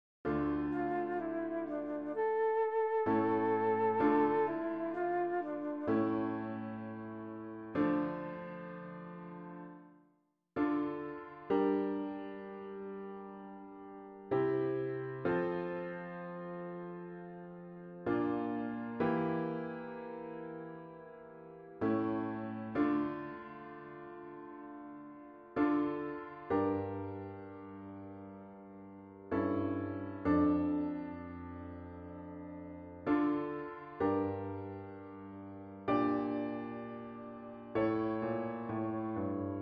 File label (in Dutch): Karaoke langzaam; solfege eerstejaars zingen ritme